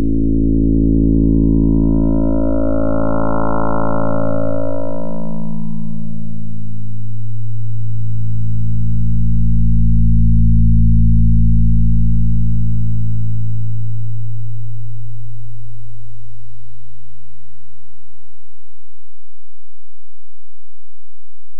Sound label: two note test